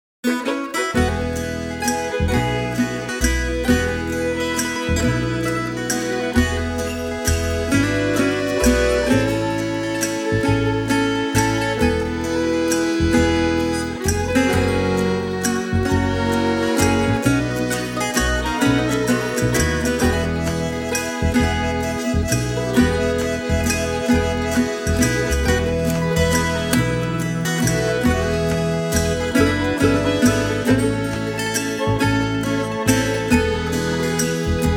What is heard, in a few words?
Jingle Bells